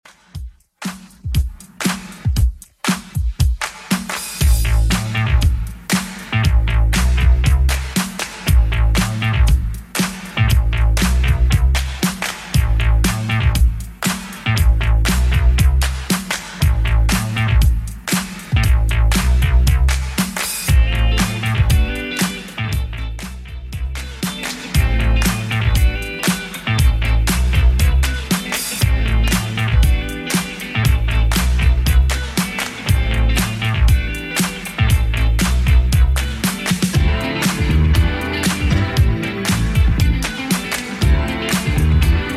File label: 80s Redrum 118bpm; remixplanet